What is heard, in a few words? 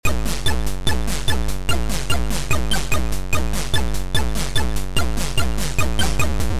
Beat; Mutant